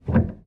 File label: piano release